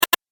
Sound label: Double Click Sound